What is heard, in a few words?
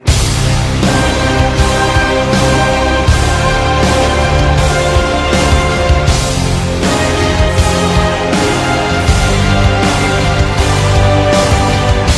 intro music; iberia